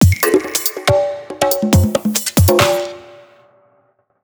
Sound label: tribal loop, Dubstep; Dubstep Sample Pack